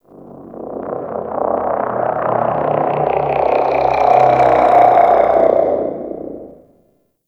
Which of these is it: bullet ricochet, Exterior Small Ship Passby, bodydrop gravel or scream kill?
Exterior Small Ship Passby